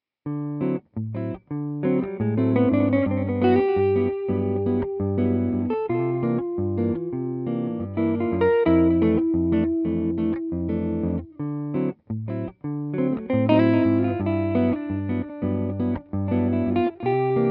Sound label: Jazz Delay; Deluxe1; ReTube Custom Shop